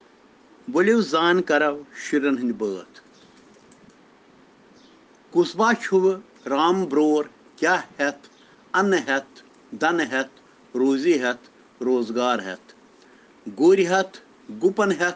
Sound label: Children Rhyms in Kashmiri